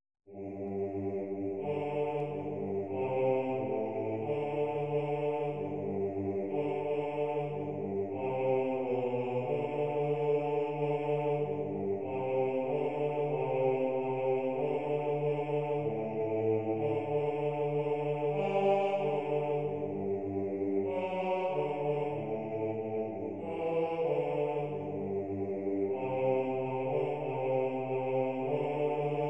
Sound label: Bass Part; Jesus Youre My Priceless Treasure